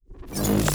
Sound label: Weapon Load (Laser); Sci-Fi Sounds